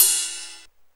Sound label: Ride Gated; Drums